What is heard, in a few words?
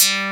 ELECTRONICA, BASSES; Pro-Rec - Synthsonic